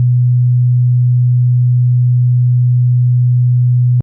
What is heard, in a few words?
Clean Sine DX100 B1